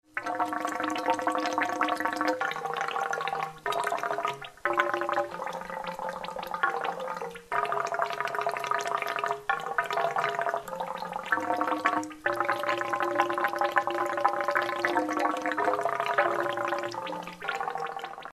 bubble organ

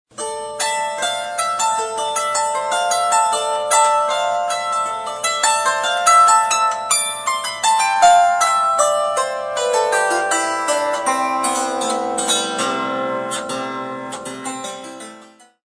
harp kora

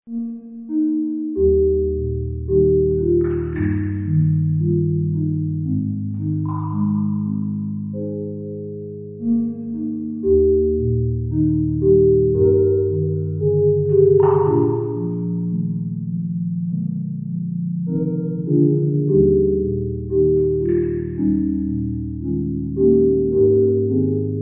stalacpipe

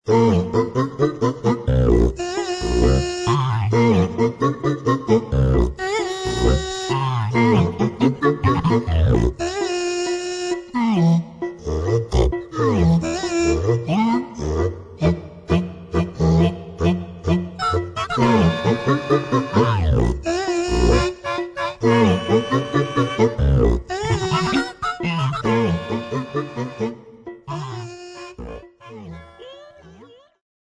daxophone

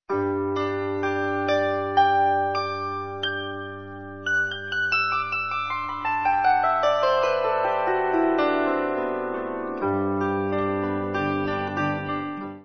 bandura

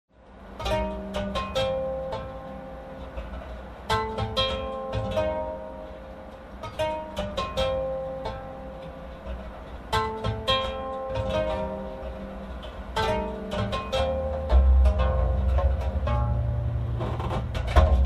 weather-harp

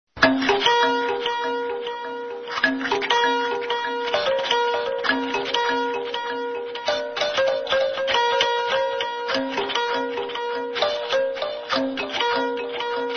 zedrin

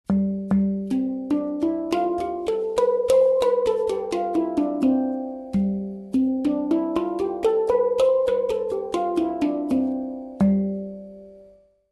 Ionian, hang

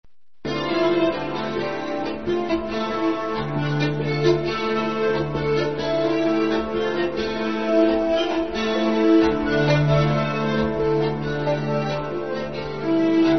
cymbalom

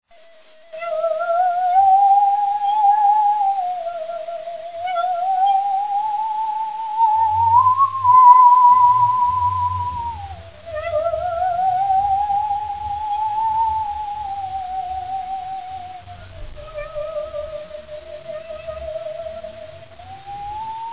musical saw

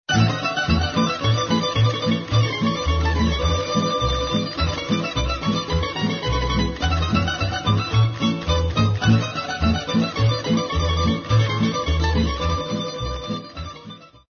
tambura

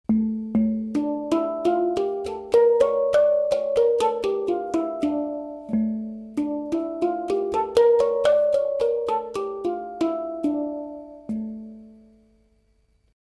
Harmonic Minor, hang

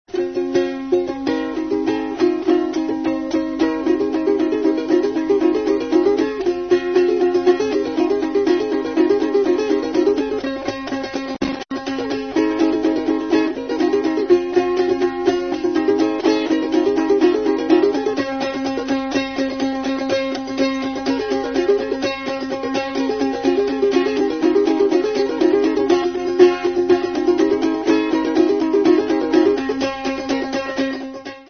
balalaika

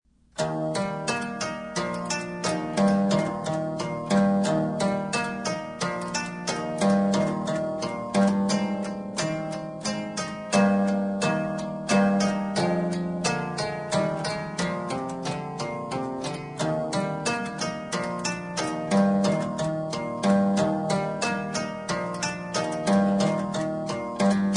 guitarpsichord

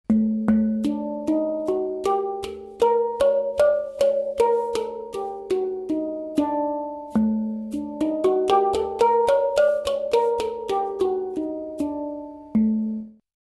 Hitzazkiar Persian, hang

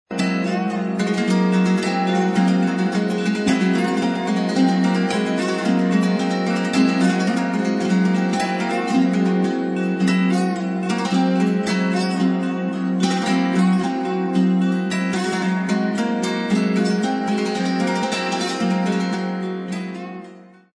celestial harp

